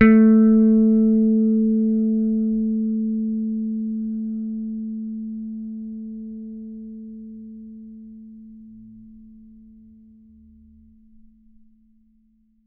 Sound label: T-BIRD BASS PICK A3; Bass Mace; DirectWave